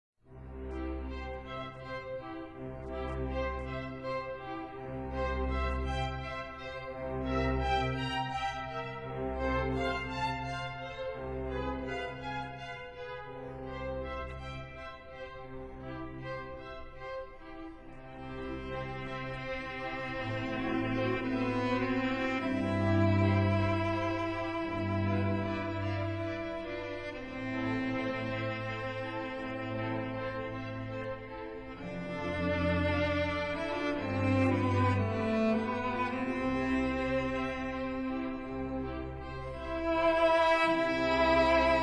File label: Instrumental Ave Maria